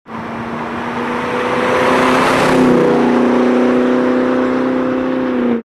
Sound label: g8gt exterior driveby, exhaust systems; corsa